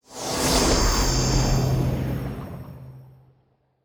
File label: harisen basin